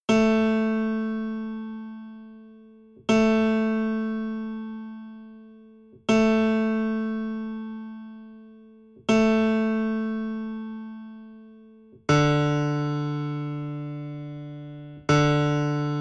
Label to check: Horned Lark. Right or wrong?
Wrong